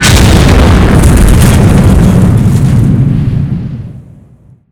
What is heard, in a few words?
aircraft blowup